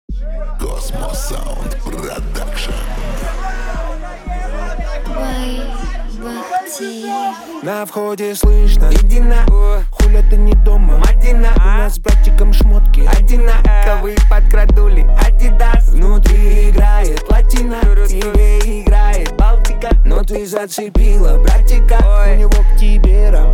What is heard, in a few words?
31-35 Hz